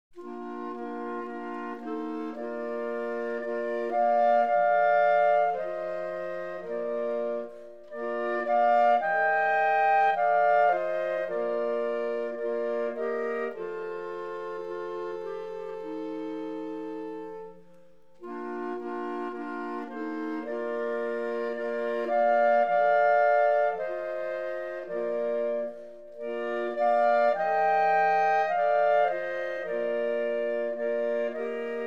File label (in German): Sing ma im Advent Karinetten Quartett